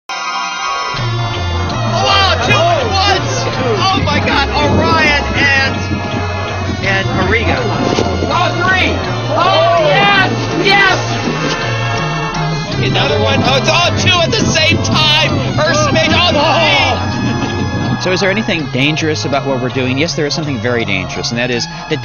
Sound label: meteor shower